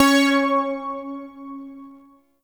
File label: Shawzin Oct Three C Sharp; Warframe; Zariman Shawzin